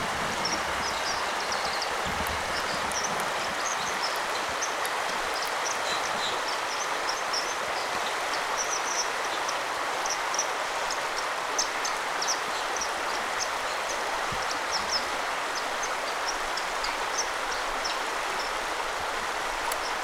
wasseramsel

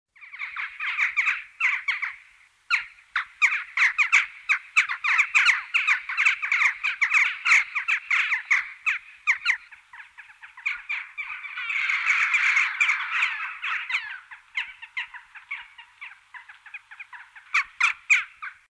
dohle

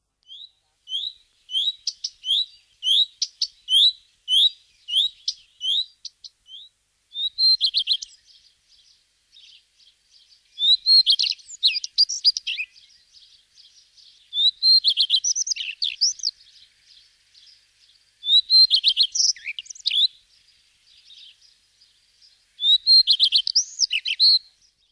gartenrotschwanz

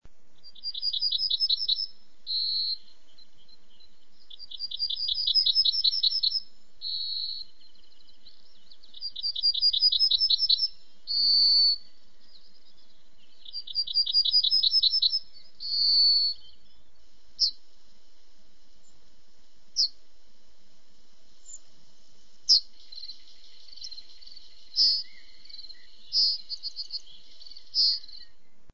goldammer

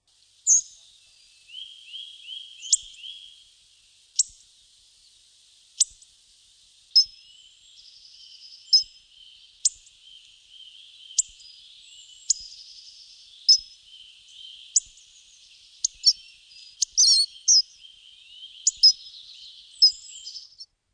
kernbeisser

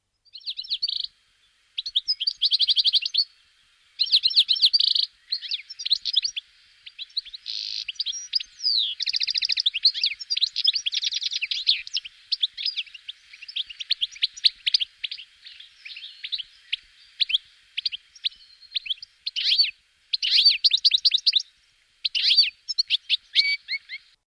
stieglitz

